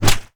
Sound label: tentacle attack; Crypt of the NecroDancer